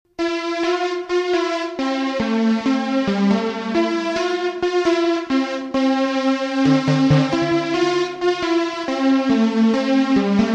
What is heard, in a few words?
ACID SOFT PAD